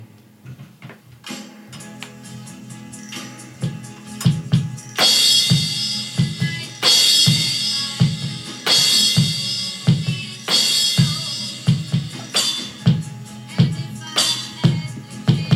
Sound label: drums